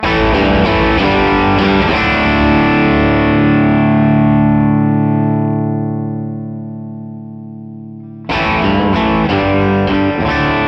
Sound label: bluguitar amp1